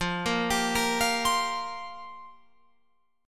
F Suspended 2nd Suspended 4th F down Guitar Open F; Fsus2sus4; arpeggiated